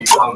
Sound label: bo pop